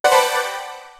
an entry sound for a, branding

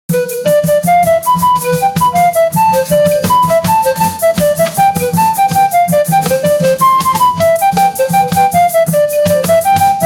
very funny brainrot music; meme

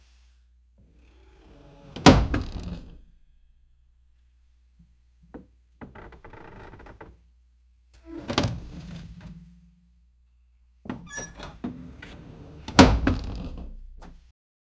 sound for openin, game effects

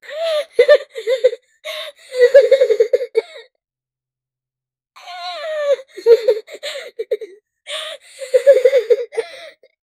5 year boy crying; child

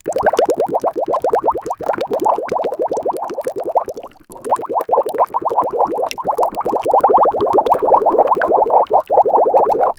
bubbles falling towards

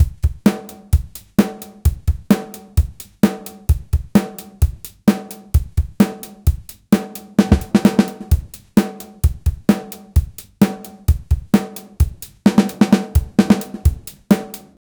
drum funk

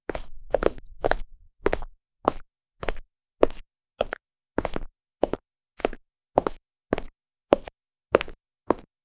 a clean footstep sound, robotics

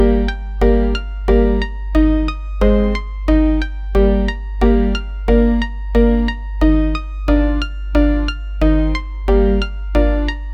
looped soothing videogame, 90 bpm; SFX Engine

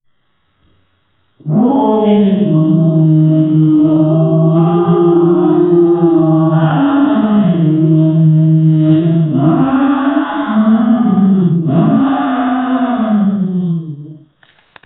sounds of hyenas roaring, forest

